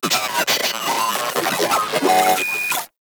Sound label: Vocal Idle; Moa; Vocal Set D